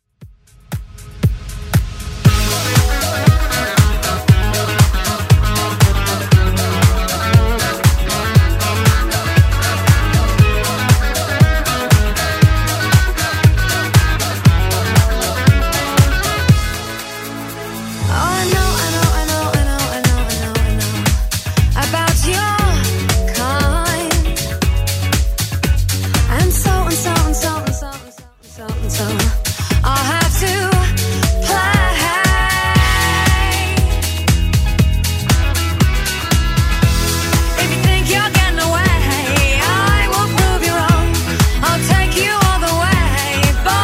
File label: Redrum 118bpm